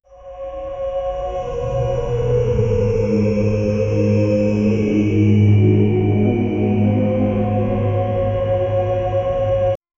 eerie ambient, haunted house

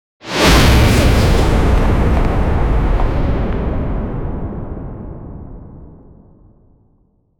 a long lasting surround, electrical

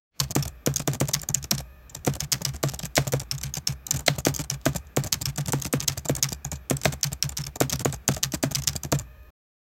smooth and soft keyboard

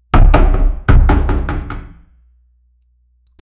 a hero using his foot

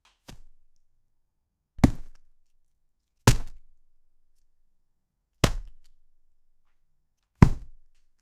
body hit, action

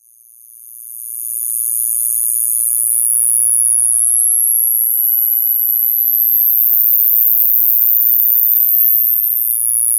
signal mayday interplanta

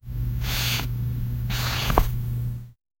notebook close up

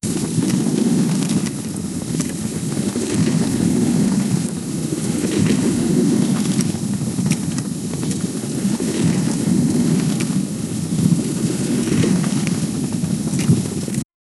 black hole sound

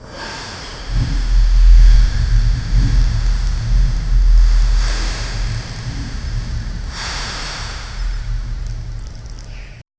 soft ceremonial throne ha, ceremonial

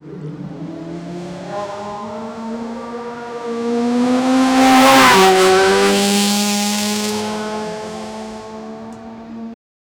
a series of race cars

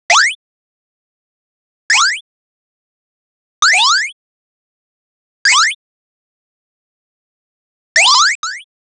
8bit sound

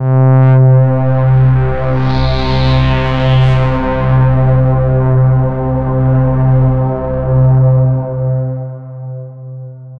a dark layered complex, pad

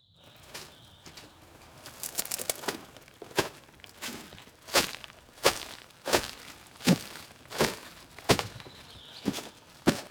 boots crunching leaves; SFX Engine

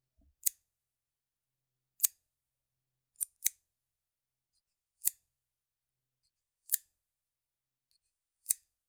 professional scissors cut, haircut

